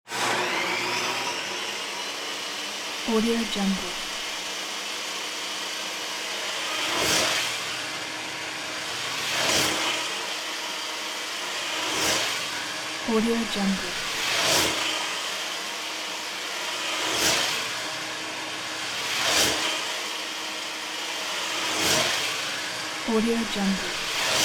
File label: table saw start blade bys mechanical